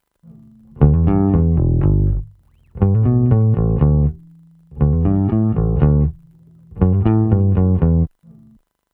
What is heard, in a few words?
IGS Audio One LA Bass